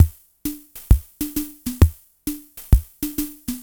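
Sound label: Machine Swing Groove 132, Lounge Drumz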